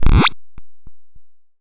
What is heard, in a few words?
door open